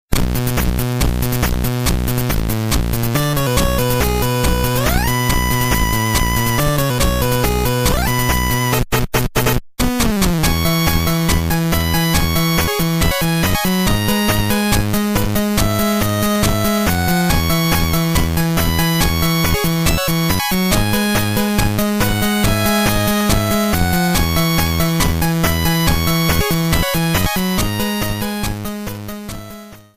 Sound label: Music Room